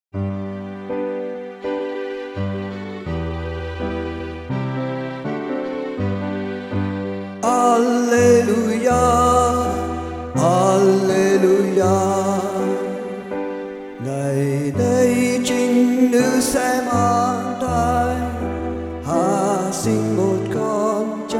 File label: alleluia song